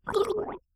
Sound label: female drowning; zps; Humans